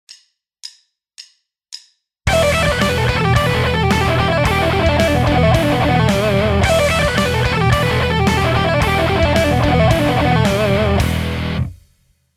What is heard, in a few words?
pentatonic shred lick, guitar; 30 day guitar challenge